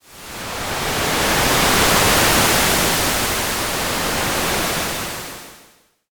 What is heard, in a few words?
wave